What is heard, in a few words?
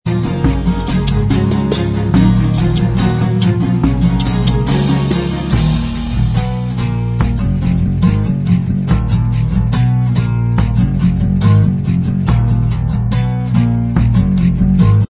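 acoustic version instrumental, backing track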